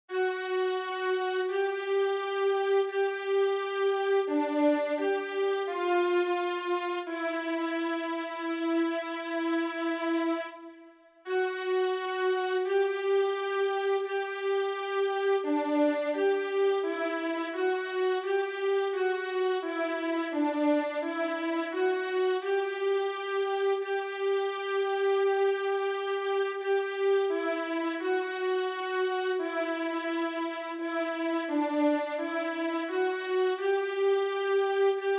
Slow Fox Violine

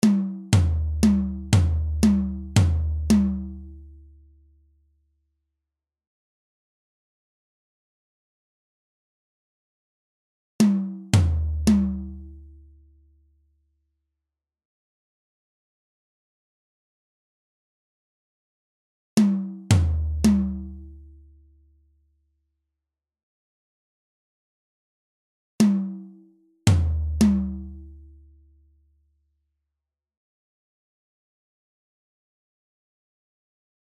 Czardas Pauke

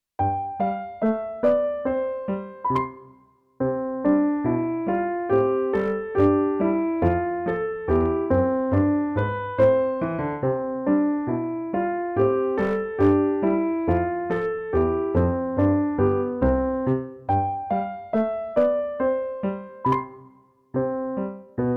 Bogenlied in C

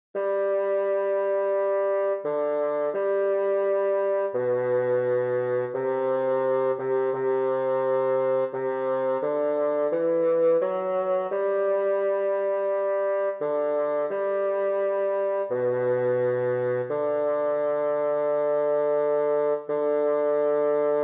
Slow Fox Fagott

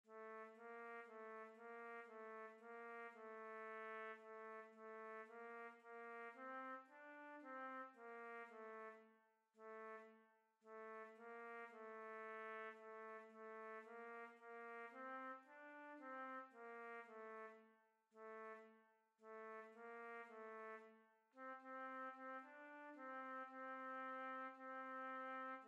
Czardas Trompete

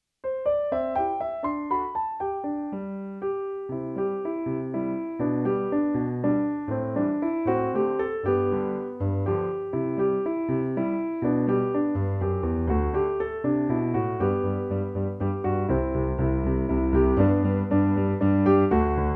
Skateboard in C

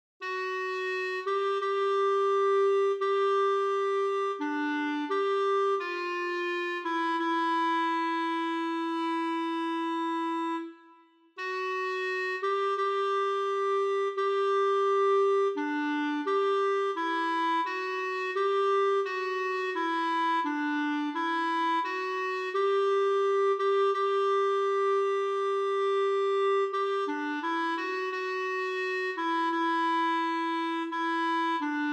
Slow Fox Klarinette

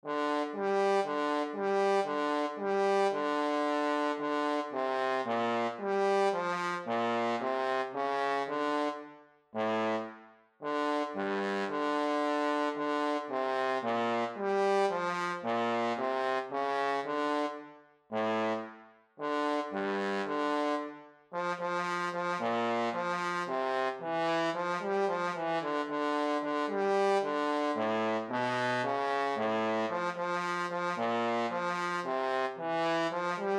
Czardas Posaune

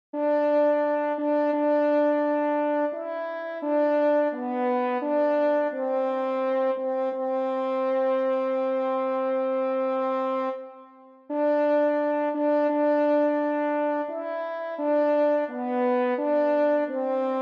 Slow Fox Alt Hoen

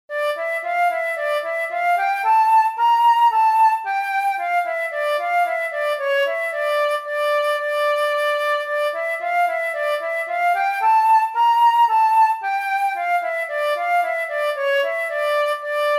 Czardas Floete

